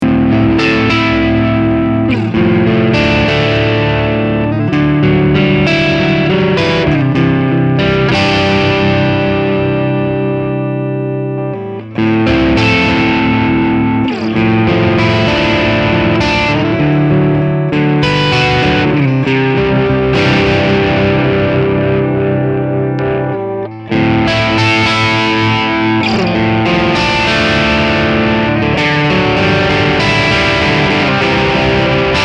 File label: crunch; harley benton te90flt